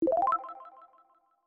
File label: win